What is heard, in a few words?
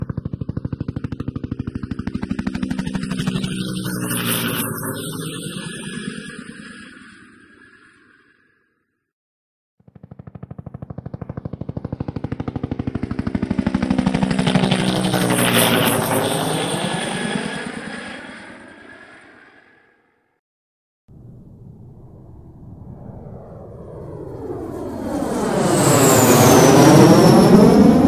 Aircraft Helicopters